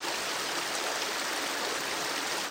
nature river; theodyssey

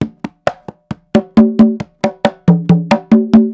drums timbali; theodyssey

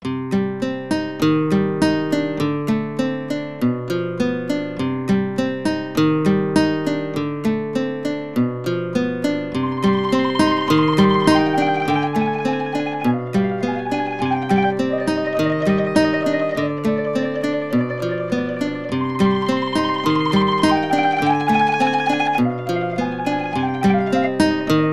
sad song mandolino; theodyssey

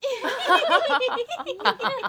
women giggle; theodyssey

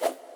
butt whoosh

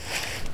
throw discus; sport; theodyssey

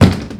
kick wood; theodyssey

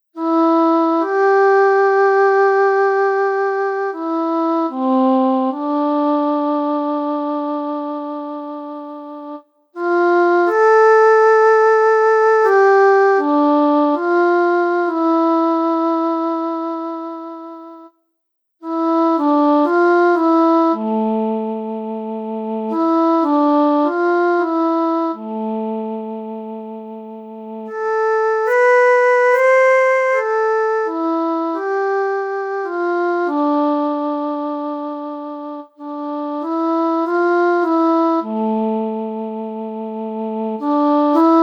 lonely song solo; theodyssey

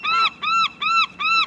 sea glaros; theodyssey

